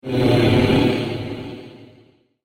Prehistoric Beast Exhausted, dinosaur sound effect; fantasy; Dinosaur Sound Effects; role playing games, magic videogames